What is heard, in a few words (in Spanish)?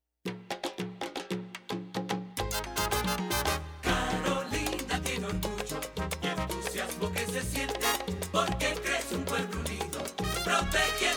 Jingle; Año del Ambiente